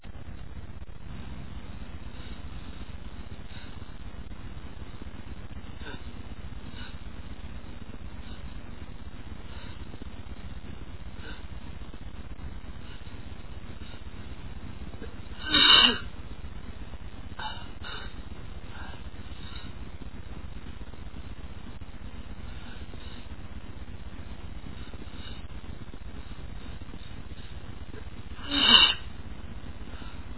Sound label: dust, male, achoo